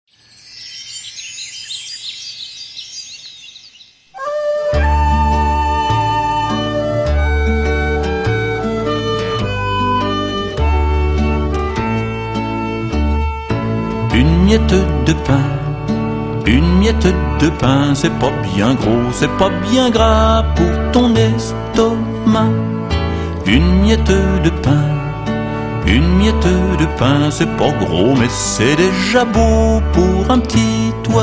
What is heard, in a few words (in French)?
chante